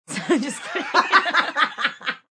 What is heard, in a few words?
Laugh Group, Female laugh, small close group, (human voice); Laughter